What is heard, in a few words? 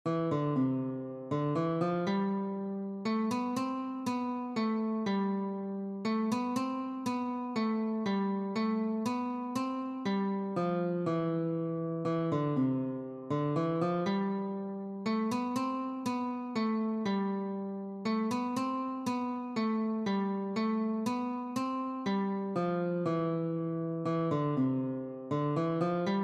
The First Noel Guitar